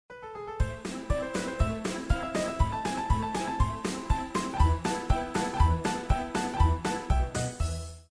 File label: circus theme